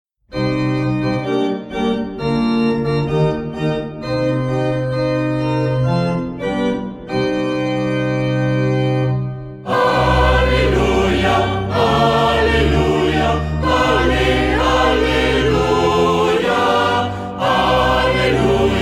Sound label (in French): Messe Alleluia; Foyer d'Amour